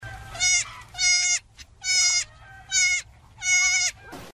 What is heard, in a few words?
goat sound